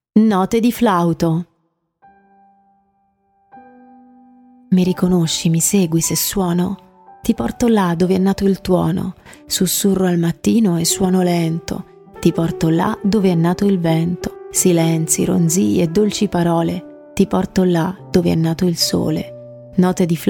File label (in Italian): Note di flauto